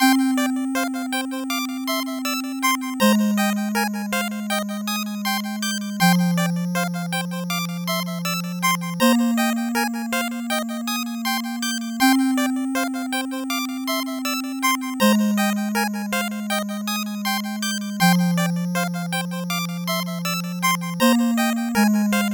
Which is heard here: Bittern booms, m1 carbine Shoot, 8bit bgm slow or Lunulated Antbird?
8bit bgm slow